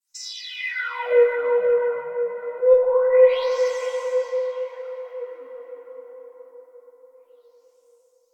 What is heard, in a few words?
Rift One Off; Warframe; Magician